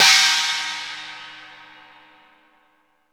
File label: Cymbals